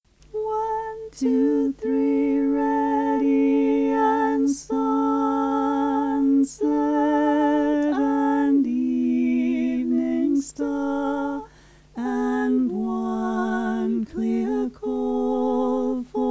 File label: Melody Bass; Crossing The Bar Resources